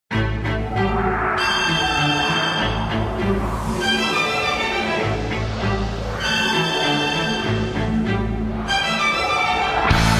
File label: Instrumental Mix